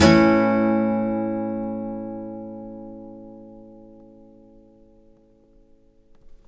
UP, Blues Guitar; ILIO; Fretworks

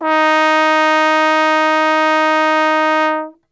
D#2 Brass Super Section; Best Service; TRMBSTRGHT; 90 s Sample CDs

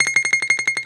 BALALAIKA, Russian Strings

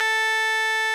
RMI; Sonic Reality - Vintage Timetraveler

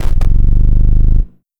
Arp Bass, Bass Lines; Sonic Foundry (Sony Creative Software); Electronic Point Blank; Basses

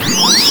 CHAOTIC, Anime Sound FX; COMIC

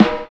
LO FI SD, Drums; USB Soundscan vol.11; AKAI

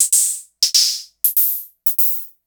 Vermona DRM1-MK2 Hi Hat; Sliced

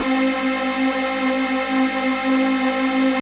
Synth C, Synth Prophet One Shots; Electronisounds

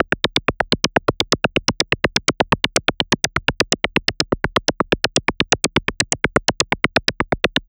Percussive

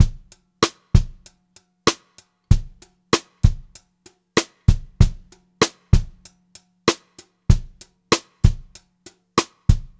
BEAT, Pop-Rock Drumloops

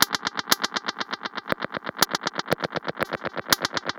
Modular Noise Rhythm (120BPM); noise hiss crackle

